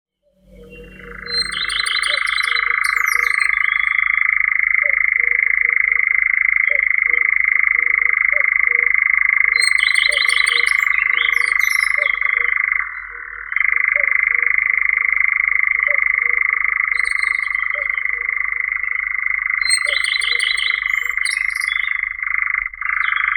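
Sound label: European nightjar, Caprimulgus europaeus; kozodoi obiknovennii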